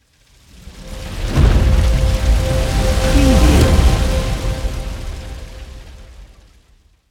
fire logo

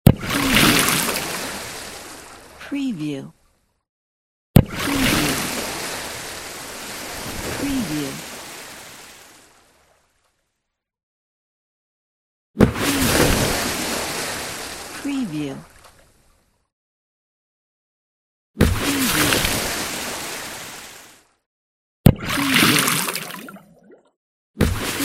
underwater bomb

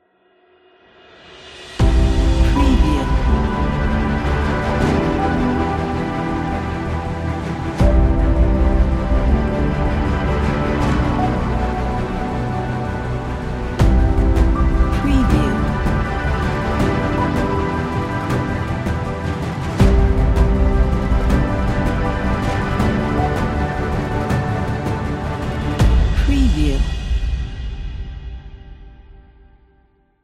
documentary nature logo

